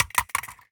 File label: phys mag drop smg metal concrete, magazine drops